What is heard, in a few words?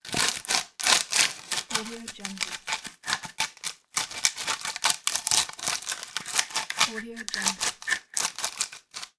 bark scrape; electronplanet